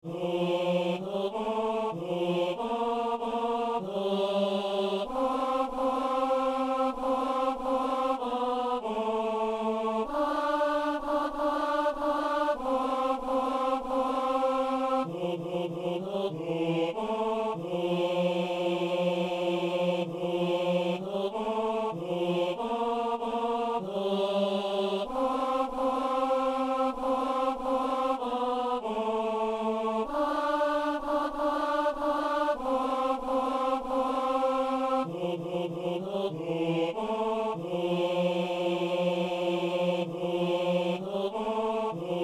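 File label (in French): messe kyrie ténor; messe de saint jean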